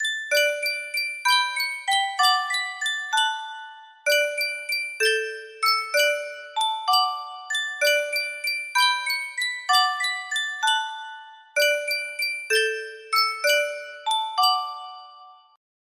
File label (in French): sankyo boite a musique